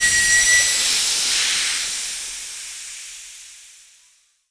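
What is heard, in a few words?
vehicle tire air hiss; Explosions; Call of Duty Modern Warfare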